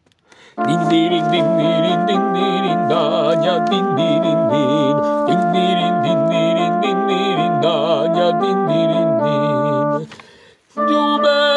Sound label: Soprano; Dindirindin